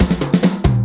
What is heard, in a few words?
Fill 140 BPM; Vengeance Samples; VEC3 Loops 140 BPM